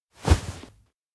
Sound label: hug; koyomi; anemoi パッケージ版 1.1